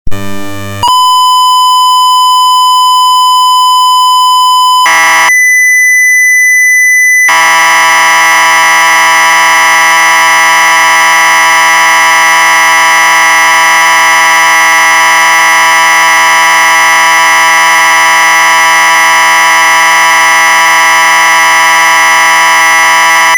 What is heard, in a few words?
egg timer